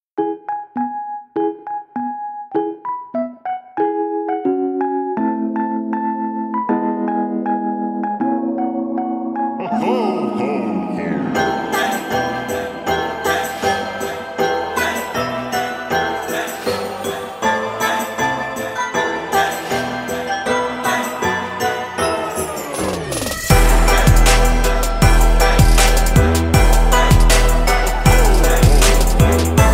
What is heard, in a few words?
Jingle Bells Trap Hard